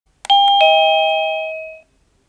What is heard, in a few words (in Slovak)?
Ukážka melódie zvonenia zvončeka